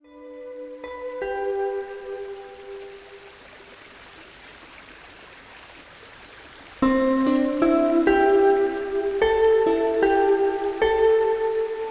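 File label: zvuki prirodyi dlya malyishey fontan, nature sounds for babies fountain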